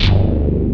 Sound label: TRON BASS; Pro-Rec - Synthsonic; TRINITY